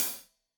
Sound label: Hat Loose, Hi-Hat, Cymbals Stereo